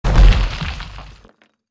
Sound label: Rock Wall Break; munin; ios macos windows gamerip 2014